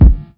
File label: good bass drum sample a sharp key; kick drum samples